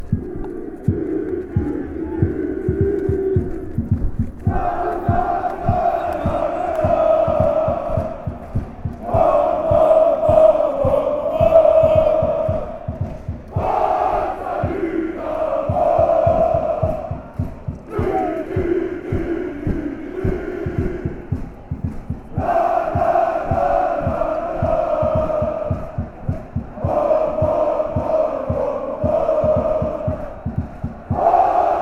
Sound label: dynamo chant